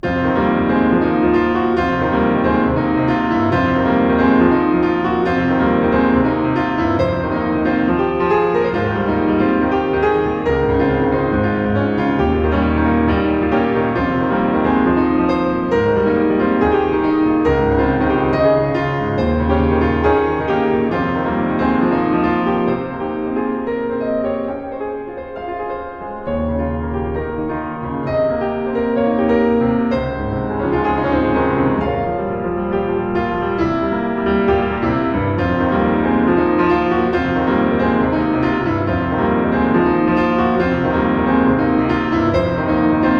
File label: piano